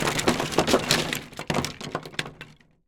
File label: wood debris crash